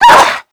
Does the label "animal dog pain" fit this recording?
Yes